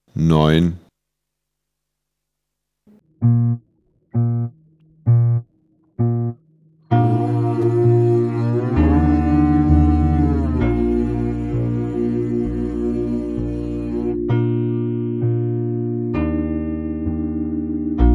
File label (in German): Klang, Hornschule